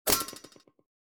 block; knife hit

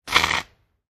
electro; furious road

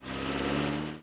car acceleration; traffic command

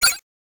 button ok; right shot

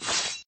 sword; orc invasion

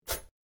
shoot; fruit master

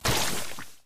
gasattack; stinkfly; steam camp

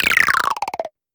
lose; zig zag line

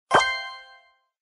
box fish; zombie mutation

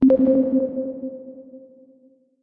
click; destroy boxes2

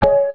notifi beep

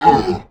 zombie; zombie mutation

